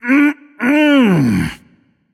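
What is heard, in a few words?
content, non-verbal; voicepack; smite; merlin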